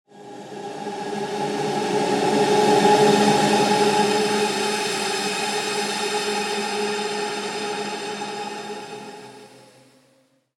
Magic Wave Of Fantasy; Magic Sound Effects

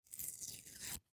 Sticker Peel Off; Sticker Peel Off Surface; Foley; Blastwave

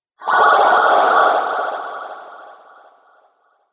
Sinister Magic Illusion; scary soundscape; Scary Soundscape Sound Effects

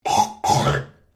MALE HACK; Male Vocal sounds; Sick; Human

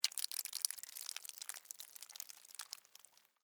Blood Spill Drip, Horror, Blood, Spill, Drip; Blood and Guts; BlastWave

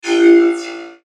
Mouseover Metallic Swell To Laser Hit; Multimedia; Blastwave

